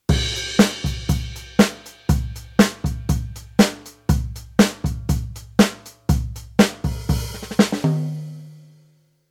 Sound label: Rhythm Kit Jazz